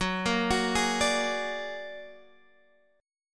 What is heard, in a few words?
Bb Augmented 7th Gb